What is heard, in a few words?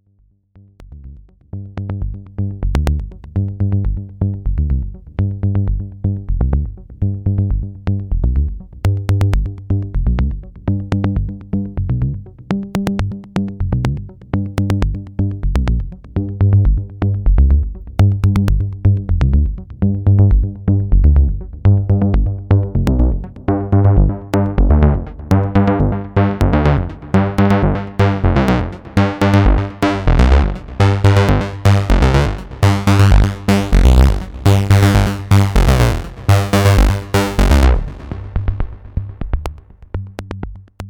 mini filtersweep